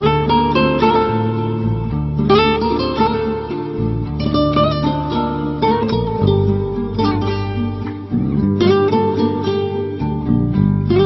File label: Classical music